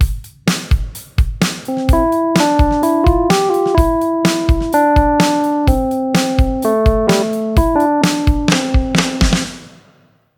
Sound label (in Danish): Simpel musik BPM 128